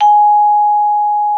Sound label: VIBES G#5; Xsample VOL-4